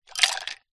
food drink ice cubes in glass liquid movement short